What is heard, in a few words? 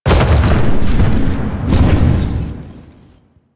Horse Glide Start; Vehicles; Duviri